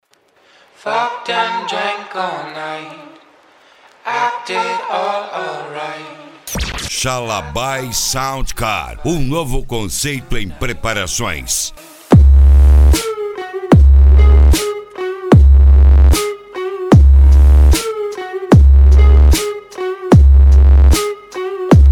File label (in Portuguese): sound car mala aberta e mega funk